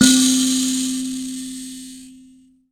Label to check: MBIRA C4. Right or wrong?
Right